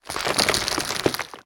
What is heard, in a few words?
bone fall; Rapids